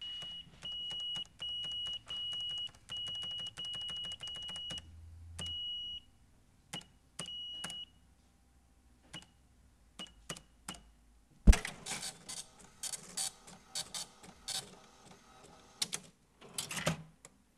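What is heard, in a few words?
cash register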